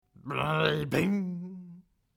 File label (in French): chaise métal tiré, Mobilier